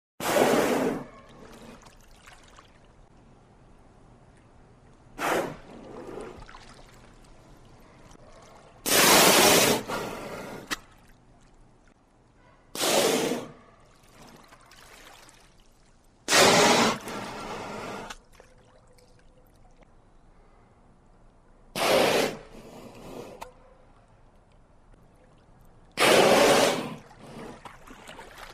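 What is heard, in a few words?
KILLER WHALE close up BLOWING background GASP AIR; wildlife sounds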